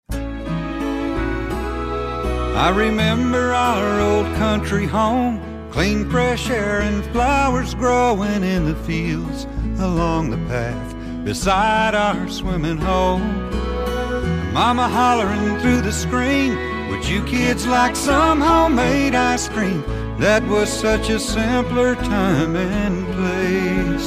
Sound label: really odd blue bell song